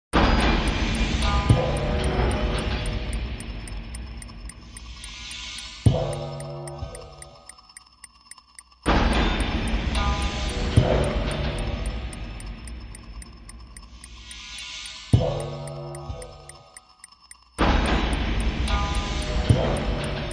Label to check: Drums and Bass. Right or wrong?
Right